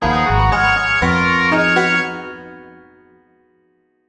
BGM, Accomplishment; Seifuku Sound; BGM Vol. 1

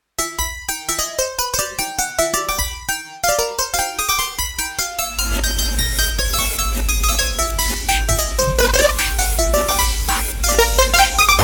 Lullaby

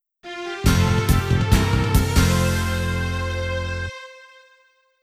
Excitement Message; Wii Room (Shopping Update) Soundtrack; Wii Channel

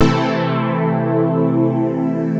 minor synth; 'Go Fuck Yourself' samples; lolicore

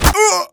pain, player; Team Fortress 2

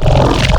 Growl Hit; Synthetic's Serum Collection

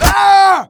death, player; Team Fortress 2

